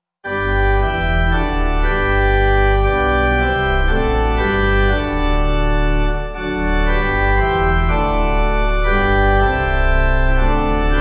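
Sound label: Pipe Organ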